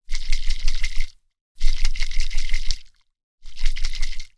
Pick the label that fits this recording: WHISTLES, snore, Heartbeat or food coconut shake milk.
food coconut shake milk